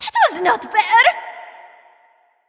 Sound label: death; Smite; athena